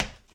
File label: tile step; Original TK Sounds